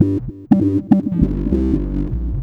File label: fuzz, bass; SWING FUZZ-3; Loopasonic